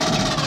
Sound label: Pulse Rifle